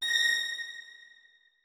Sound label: notes, keyboard; Hideout Furniture